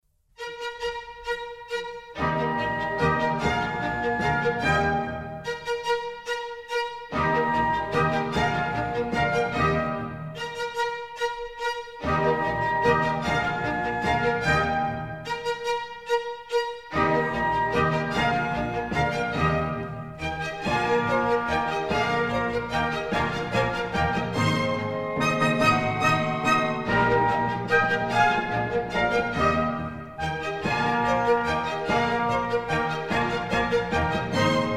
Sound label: German Dances, Eb Major; Mozart; Dances and Marches